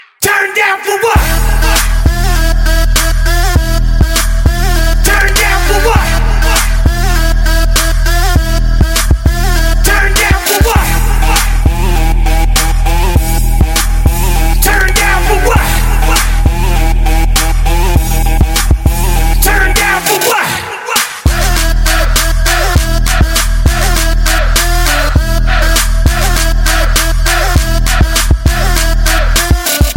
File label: Samsung Galaxy S7 Edge Ringtone; ringtones